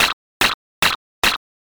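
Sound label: footsteps sand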